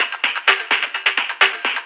FX Loop 128 BPM, House; Best Service; House Definition VOL-1

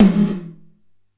Gated Tom; Gated Reverb; Univerb; musicradar

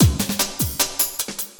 LOOP, Drum & Bass, 150 BPM

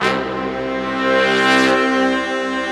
big brass, Slow Big Brass; Optical Media International - Sonic Images Library

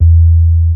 D&B Bass Hit; neuro

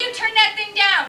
VOX; Zero G; Man Machine

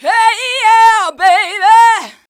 VOX, Singin

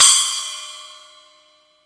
SPLASH; East Collexion; Drum 1 Dry

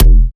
kick lofi

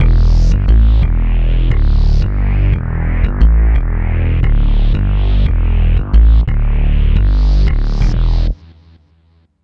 Synth Bass; Bass X

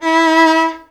VIOL E3, VIOLON, Arabian Traditions; USB Soundscan vol.43; AKAI

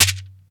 AMB P, HALL KITS; Crypton - DrumBase5000

